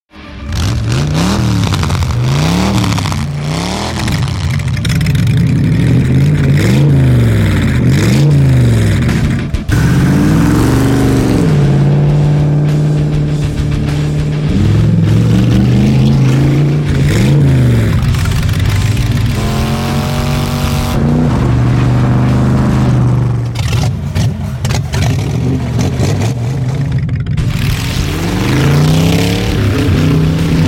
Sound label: Hot Rod Rev Ups; BOOM Library